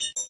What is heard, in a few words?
Wrap Back Louder; ESAN VO Sound Schemes; ESAN VO Sounds Pack